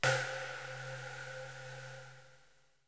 Korg Z1 Snare

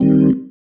Stab One Shots, Organ

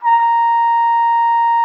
TROP A#5, TRUMPET; Xsample VOL-3

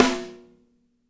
SNR; Spectrasonics; Burning Grooves

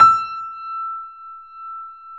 E 5, Keyboards Acoustic, GRANDP ST; USB Soundscan vol.17

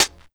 91 DRM SND, Pure Electro; USB Soundscan vol.03; AKAI

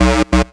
Place Synth; M8

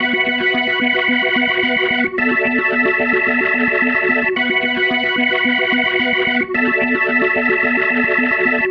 Psychedelic, Organ, Loops

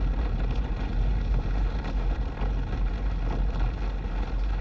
Ambience Loop, outdoor

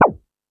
Hip House, Drum Machines E-MU Pro-Cussion

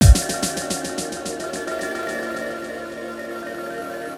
Dub Drum + FX; Sonic Foundry (Sony Creative Software); Ambient Grooves; Dub Hits

